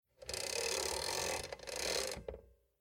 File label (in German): Wagen Bambino Schreibmaschine, Bambino children's typewriter; 1950; Bambino children's typewriters